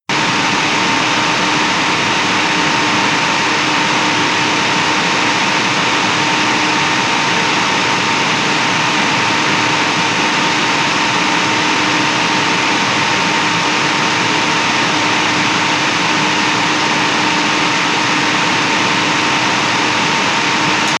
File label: Gaming Noise